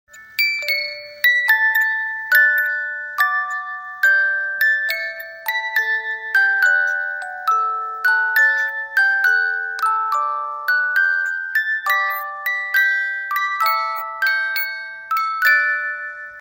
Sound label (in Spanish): Himno Madrid